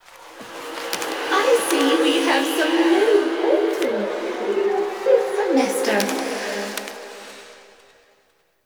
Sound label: Interior Cave, Ambience; Zariman Thrax Memories; Warframe; Duviri